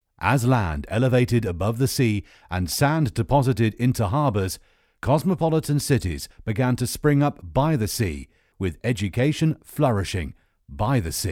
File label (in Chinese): 笑